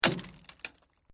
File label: Glass movement minor; Materials